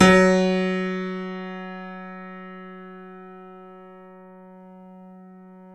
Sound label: C7; East West; The Ultimate Piano Collection